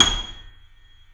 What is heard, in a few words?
C7 PP, The Ultimate Piano Collection; East West